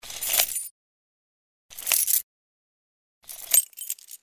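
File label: sound of keys jingling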